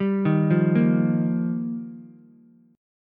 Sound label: F 7th Suspended 2nd Suspended 4th, down, Ukulele; F7sus2sus4; arpeggiated; JGuitar